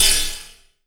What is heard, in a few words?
Oneshot